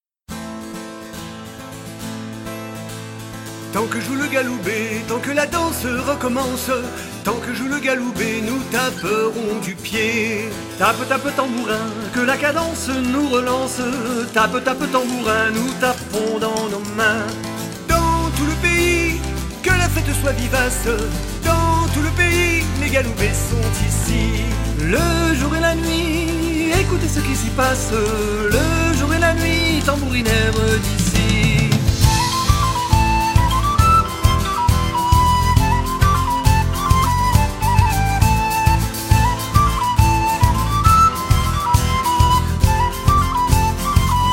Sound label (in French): Tambourin, bagad